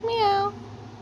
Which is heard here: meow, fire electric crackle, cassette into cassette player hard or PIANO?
meow